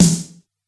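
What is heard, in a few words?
Yamaha psr313 rock snare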